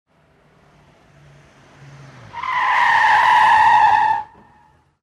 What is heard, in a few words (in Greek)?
Mesa sygoinwnias Frenarisma